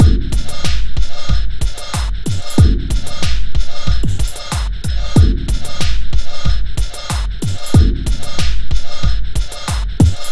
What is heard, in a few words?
Beat, Drums & Percussion; Electrocution; Beats